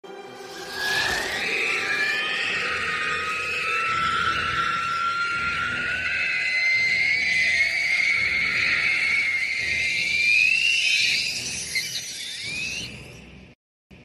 witch king screech

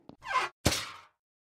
vent in

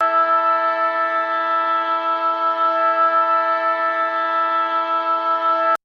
siren; afl

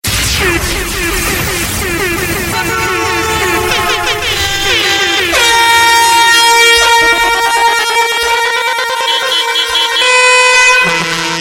mega horn

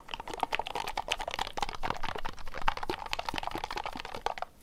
shake

